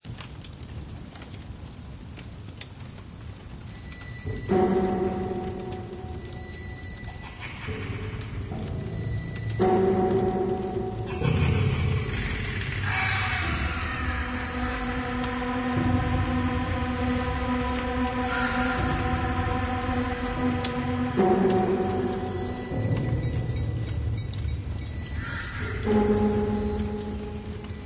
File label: Haunted House Main Inside; Quicksilver